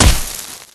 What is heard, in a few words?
branding iron hit flesh; tf2